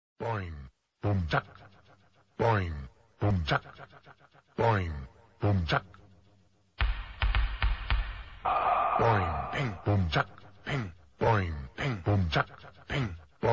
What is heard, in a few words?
ringtone